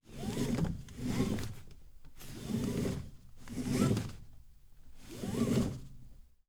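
Renault Master IV 2.3 DCI 165 Foley interior seatbelt; mono; Soundholder; Car Foley 2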